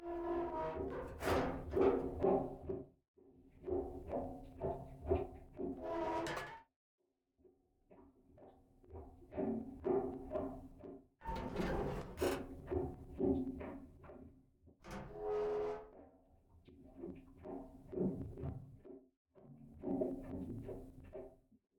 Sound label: Metal Pipe Groan